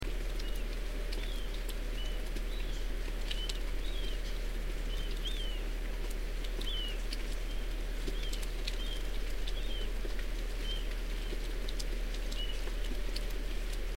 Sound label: marbled murrelets